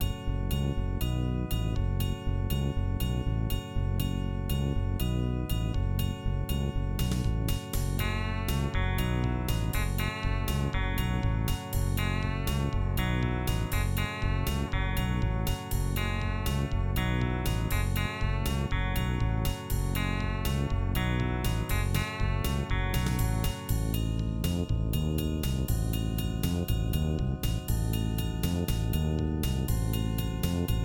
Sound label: Searching, Roland; Blake Stone Aliens of Gold; MS-DOS